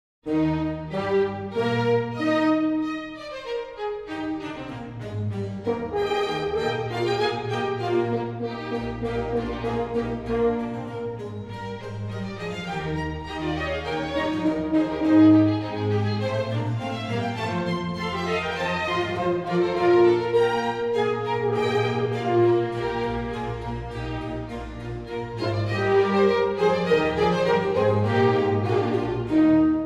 Concerto in E Flat Major for Horn and String Orchestra - Allegro; Baroque.horn